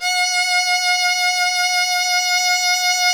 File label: Synclavier Strings; ILIO